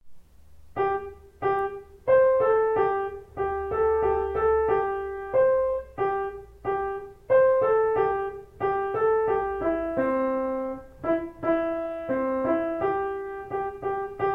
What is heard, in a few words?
circ piano; quan erem pallassos; cancons